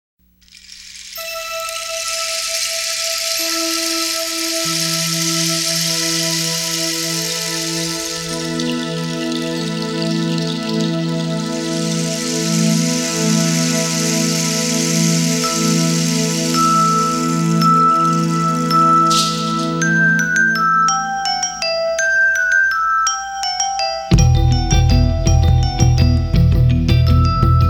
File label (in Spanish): Coro, Villancico de las campanas; Coro de Produbanco